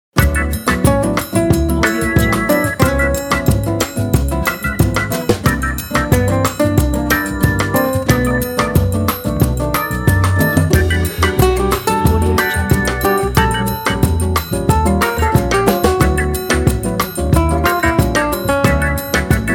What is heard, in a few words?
a quiet break with a book ident